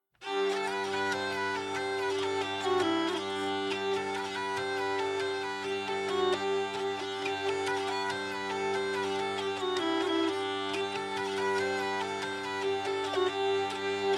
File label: Tavern; Assassin's Creed Unity